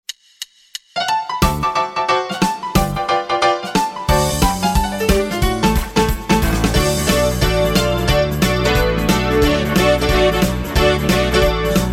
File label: Honky tonky blues D dur